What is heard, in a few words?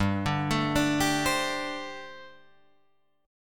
G Suspended 2nd Suspended 4th G, Guitar, Drop D